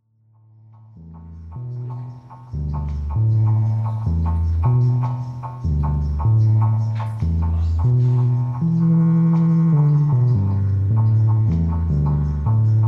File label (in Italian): Ritmico